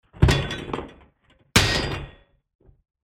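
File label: metallic mats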